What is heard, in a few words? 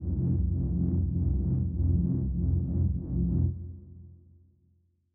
kaiju superweapon energy pulse; biogenesis